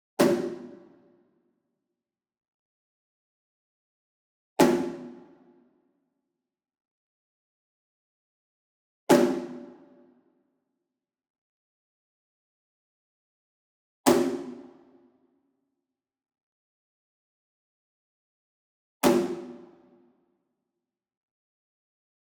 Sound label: ENSEMBLE DRUM Shime Daiko And Chinese Tom Hit Medium; boom library; Cinematic Strikes Construction Kit